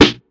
long room reverb acoustic snare sample D key, snare single hit; snare drum samples